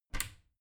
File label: bathroom cabinet warped open regular; objekte; tueren